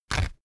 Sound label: toilet paper sheet tear off; haus-garten